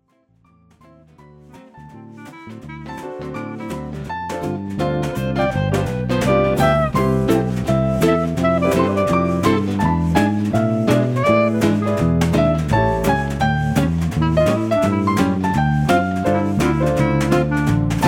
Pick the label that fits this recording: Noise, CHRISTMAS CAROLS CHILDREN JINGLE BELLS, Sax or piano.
Sax